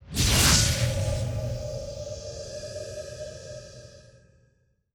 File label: Mage Skill